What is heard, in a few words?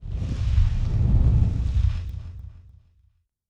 ui skill change; lucius